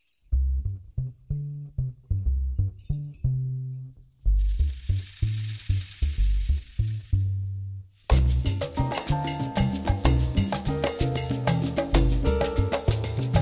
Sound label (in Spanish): el tambor de mongo; alto